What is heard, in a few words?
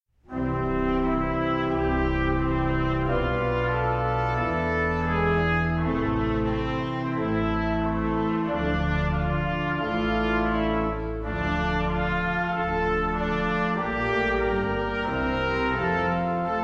Hymn